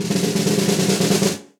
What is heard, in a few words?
Snare Press Room With A Vista; Snare Articulation; Drum Hits; Session Drums Studio; Standard Hits